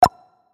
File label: Title Screen Button Press; kritter krawler